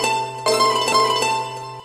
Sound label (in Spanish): guitar